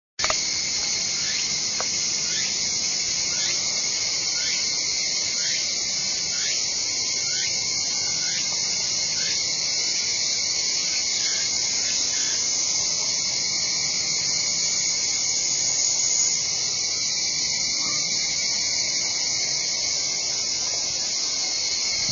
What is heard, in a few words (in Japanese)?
tukutukubousi; ikimono; doubutu